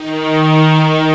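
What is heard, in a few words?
strings D#4, YAMAHA, Analog Fantasies; USB Soundscan vol.49; AKAI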